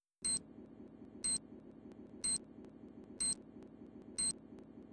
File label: beep, weapons; garrysmod; gamefreak